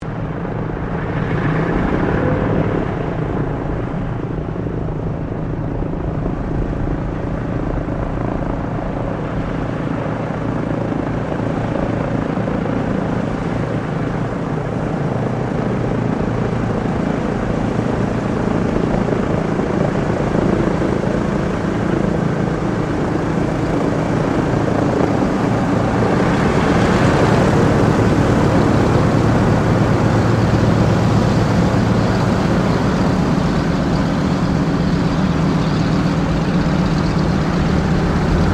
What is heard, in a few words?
AIRCRAFT LOCKHEED HUDSON IDLE TAXI, Hudson twin engine light bomber; Airplanes; Vehicles